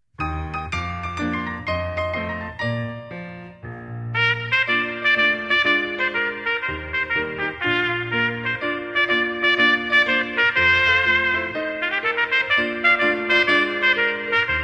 Музыка; В час, когда труба Господня; Помни, око Его

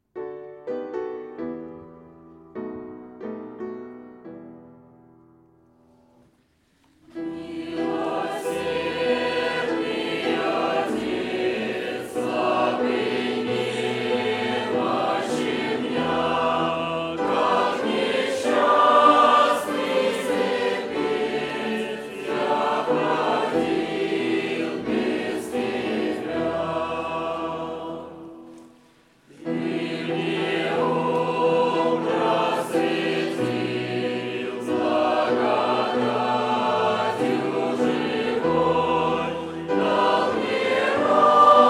пение; Милосердный Отец; г. Саки; Записи Богослужений

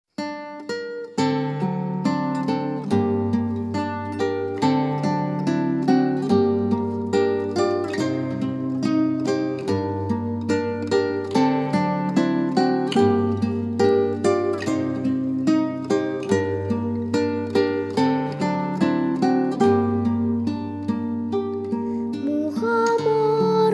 пение; Мухомор

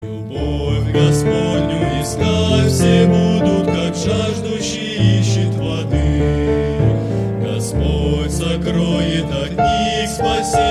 Пение (Взирайте, люди); Духа не угашайте

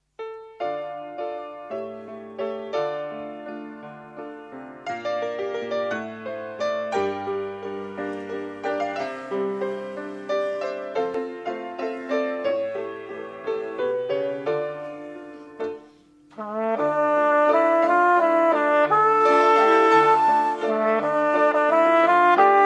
Музыка; Посещение цыган г.Геленджик 26.02.2006

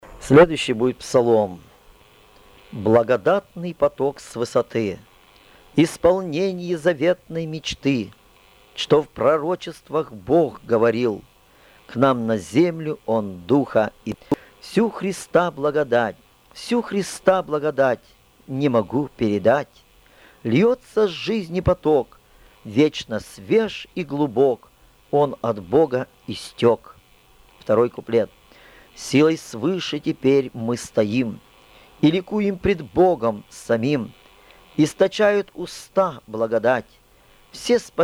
Благодатный поток с высоты (псалом)

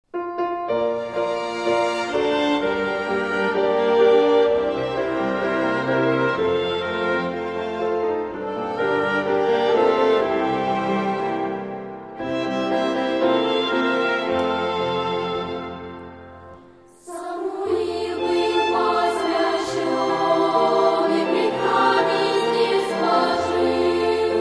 Самуил был посвящён (пение)

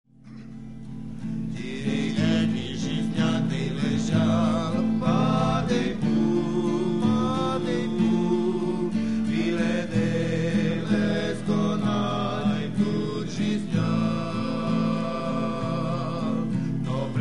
Пение на цыганском; Табор с.Королёво

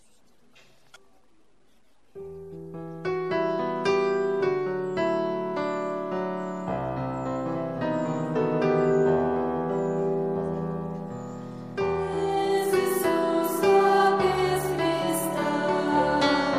Пение; Без Иисуса, без Христа; Подростковый поток Апшеронск-2010-B

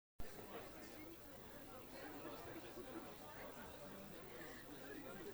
пение молодежи; Пенской молодежи; 2015 Молодёжный лагерь. Курск

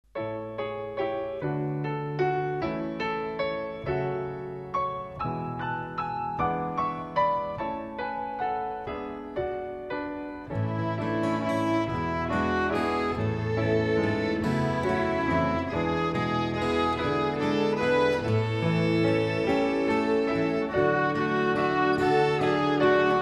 Музыка; Благословений потоки; Жду Тебя, о Спаситель

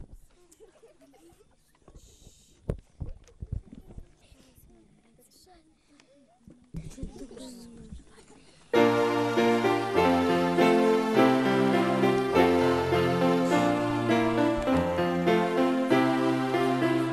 Пение, Детский лагерь; В доме Господнем; Апшеронск-2010

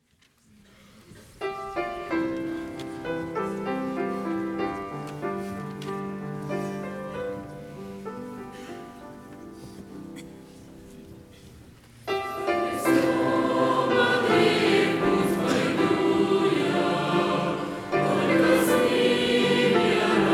Общ пен (Со Христом бодрее); Сибирь-17

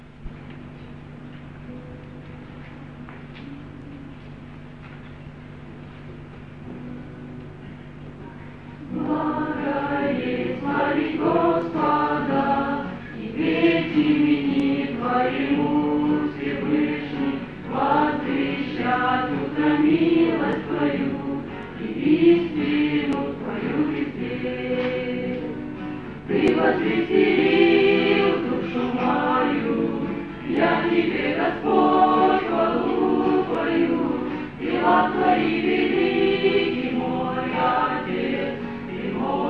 Пение; Благо есть славить Господа; МО в г.Белая церковь

